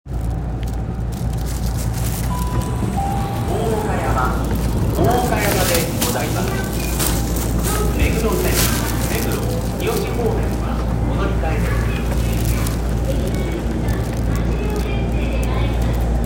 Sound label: train sounds; 東急大井町線大岡山西